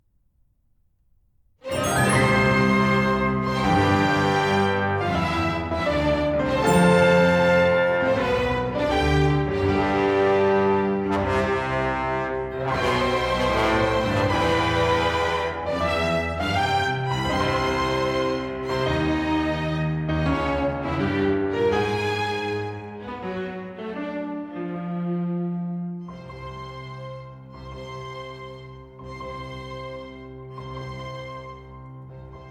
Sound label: partita in c, sinfonia, orchestra